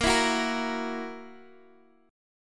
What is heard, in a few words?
E Suspended 2nd Flat 5th A sharp